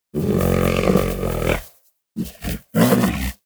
Vocal Snore; Warframe; Predasite; Hunting Critters; Characters

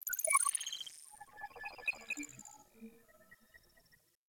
Beep Short, Ambience Interior; Warframe; Orokin

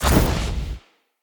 Casting Device Cast Right; Weapons; Player; Fenn; Casting Devices

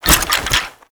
Bunraku Puppet Movement; Warframe; Koumei

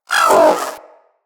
Vocal Pain, Pets; Zanuka; Zanuka Companion A

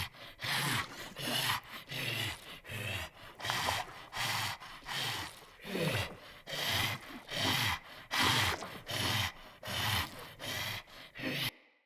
Corrupted Sproutfolk Breath Run Loop, Vocals; Enemy; Characters

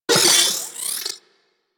Vocal Detonate; Warframe; Corpus; Mini Moa

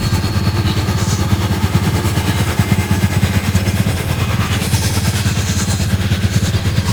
Idle Loop, Vehicles Flight; Warframe; Liset; Stalker Liset Skin

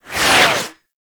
tank missile barrage whizzby; Enemies; Abilities; Lasrian Tank; Warframe

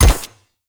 Shot Mech, Weapons; Akarius Prime

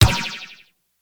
Ball Bounce Default; Warframe; Tenno Ball; Missions

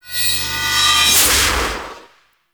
Sword Heavy Attack Charge; Warframe; Duviri; Dax; Weapons

